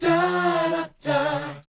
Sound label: BOYZ, Vocal Planet, R&B Dance; Spectrasonics Vocal Planet